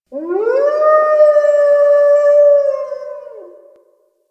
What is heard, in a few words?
Wolf Howl; Duviri; Animals